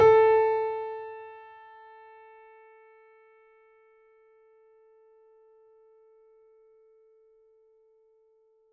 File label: mini grand; bananenkeyboard